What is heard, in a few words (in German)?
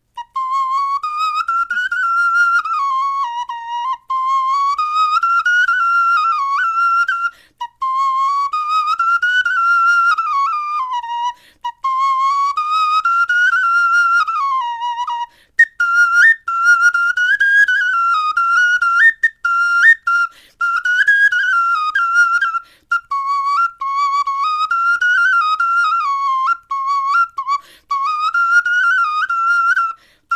melodie, knochenfloeten